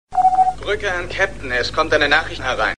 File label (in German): nachricht kommt, Klingelton